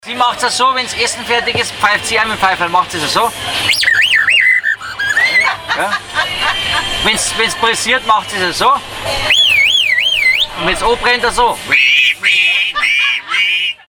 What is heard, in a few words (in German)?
Voglpfeifer